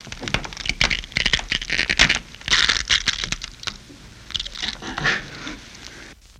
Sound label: Bone Crunch Break; punches kicks hits bones